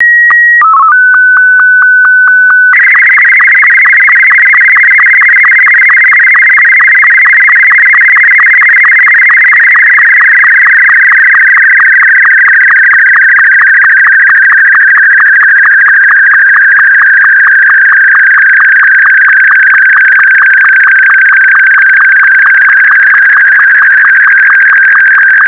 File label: SSTV Martin2